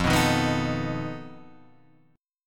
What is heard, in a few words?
F 7th Flat 5th F down